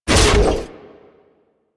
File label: Thumper Bot Thumper Pre Impact Mech; Warframe; Grineer